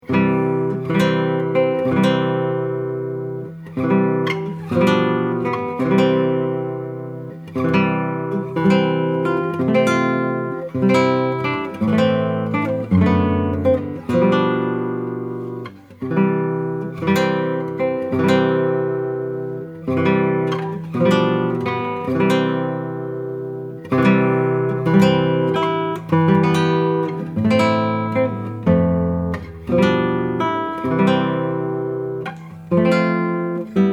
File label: bridal chorus